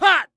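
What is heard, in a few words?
Mon Man ATTACK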